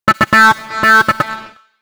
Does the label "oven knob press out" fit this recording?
No